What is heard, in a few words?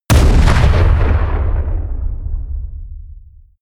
explode close